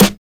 00s crunchy steel snare drum sample g key, steel snare drum sample